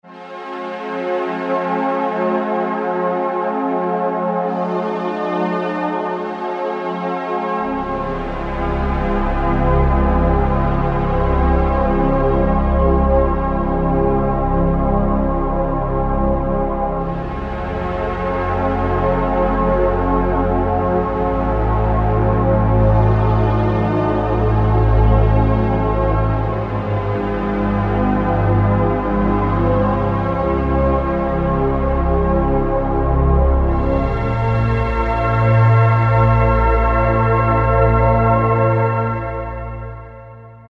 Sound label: Dense Floor; Roland SR-JV80-11 Techno Collection